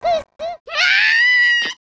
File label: RUDOLPHPET CRY; Eft